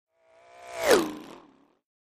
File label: Space Ship By, space ships